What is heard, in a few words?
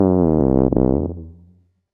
fart tuba